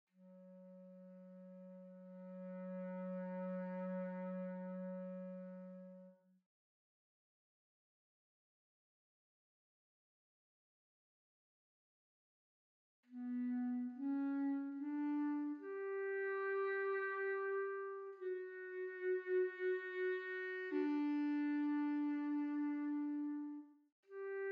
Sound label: Clarinet